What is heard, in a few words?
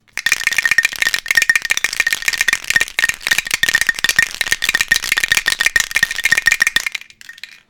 Rattling Bones